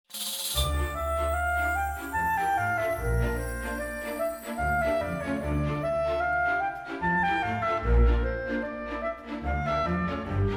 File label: Clarinet Solo Adventure Theme